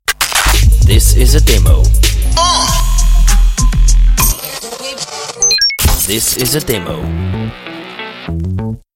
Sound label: Hip Hop RnB Mashup beat